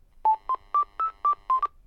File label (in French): Telephone GIGASET DA710 Sonnerie